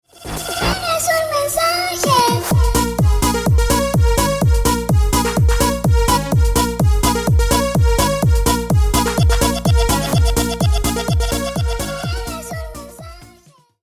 tienes un mensaje remix

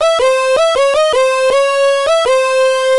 bajo

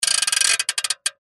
juguetes juguete

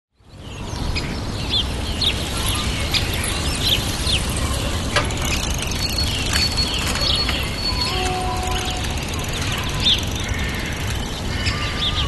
naturaleza para relajarse

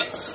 cocina

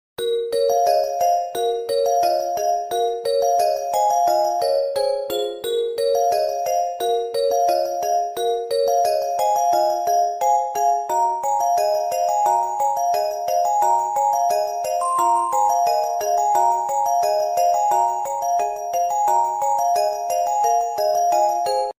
tono cute

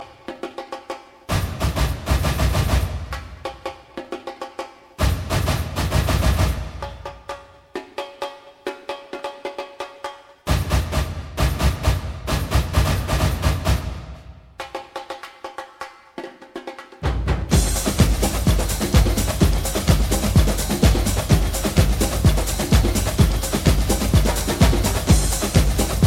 batucada

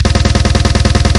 golpearon

